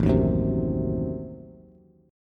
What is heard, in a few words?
A Diminished 7th A down Bass; Adim7 strummed; JGuitar